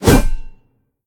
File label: sm swing; smite; gilgamesh